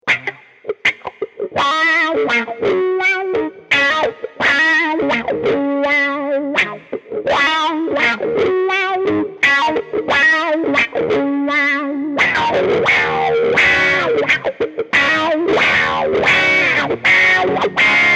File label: wah wah